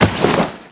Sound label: sticky bomb pickup; Spearhead Demo; MacFormat 2003 June